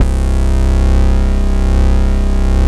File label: MOOG BASS; East West - Phat & Phunky CD2; 90's Sample CDs